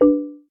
notification in call; LeEco Le 2

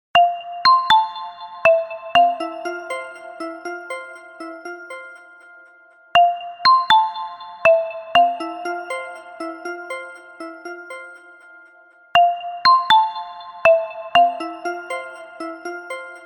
Elegant Treble, ringtones; Infinix XOS 12

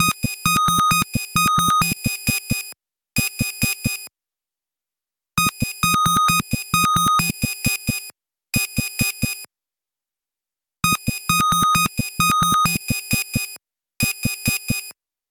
incoming, alarms; Nothing OS 1.5

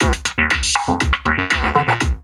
Across; LG P940 Prada 3.0; notifications

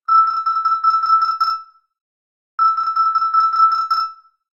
Short Beep, alarms; LG P940 Prada 3.0

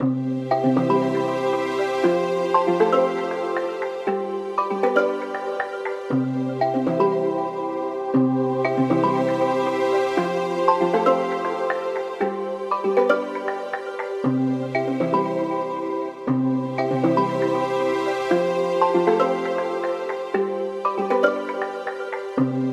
Morning Forest; Honor 600 Pro China; ringtones

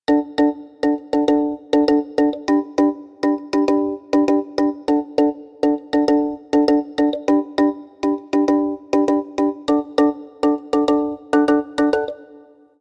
Exciting, alarms

